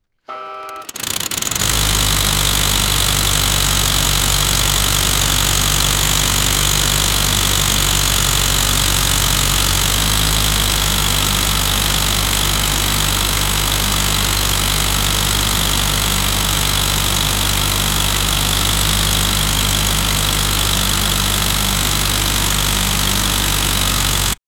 sound of broken running e, automobiles

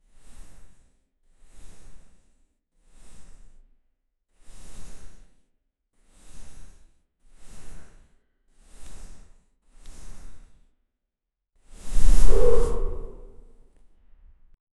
ghostly paw touch sound

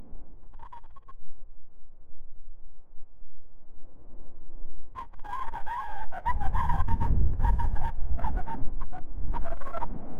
intermittent rattlesnake, reptiles

